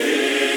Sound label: choir